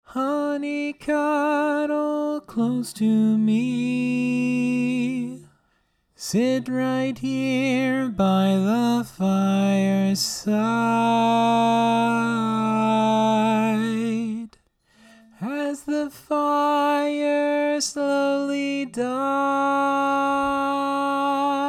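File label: Baritone, barbershop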